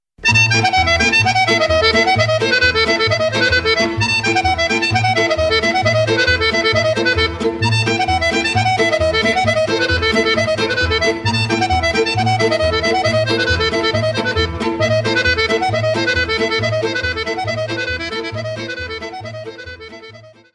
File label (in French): Vidinsko Horo, Bulgarie